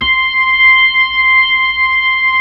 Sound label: B-3 C320, Brite; Xfade; Keyboards of The 60's and 70's